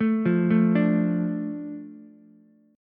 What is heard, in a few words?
A Suspended 4th Sharp 5th, Ukulele; Asus4#5; arpeggiated; 2,5,5,5; JGuitar